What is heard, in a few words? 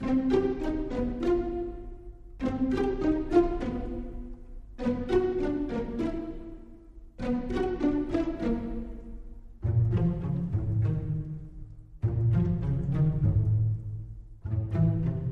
Dark Graveyard, Pizzicato